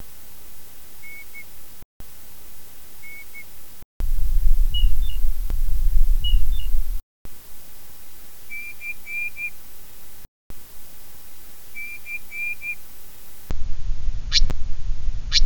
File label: all calls combined, night parrot